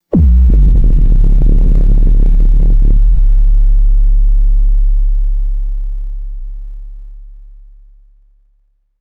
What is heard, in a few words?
cinematic synthesized bass drop impact; designed